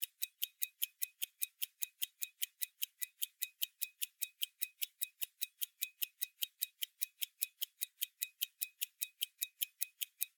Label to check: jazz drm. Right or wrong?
Wrong